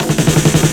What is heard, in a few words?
Prog Stepz Cut, Drum Loops, 166.5; Zero-G Jungle Warfare 1, 2 & 3; 90 s Sample CDs